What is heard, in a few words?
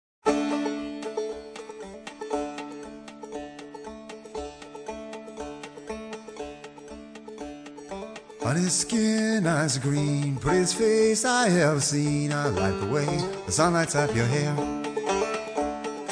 acoustic